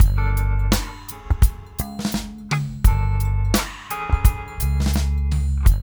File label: mix, funky loops; East West; Funky Ass Loops